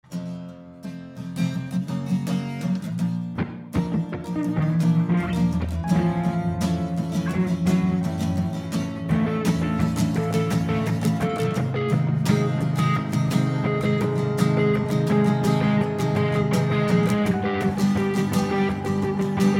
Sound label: acoustic